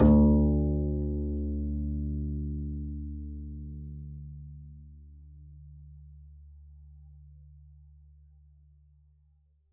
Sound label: pizz d2, double bass; dsmolken